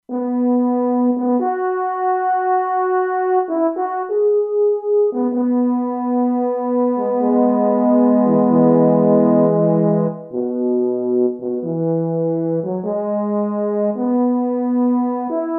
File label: Verb Horn; Factory Presets; E-mu Proteus-2